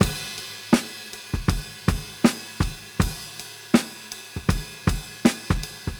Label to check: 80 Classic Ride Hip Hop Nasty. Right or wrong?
Right